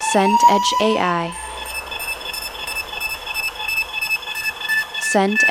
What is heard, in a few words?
siren ambulance